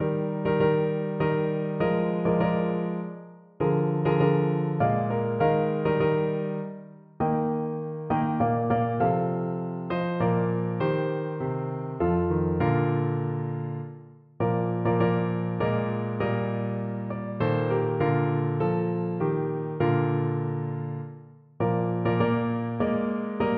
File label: schweizerpsalm, piano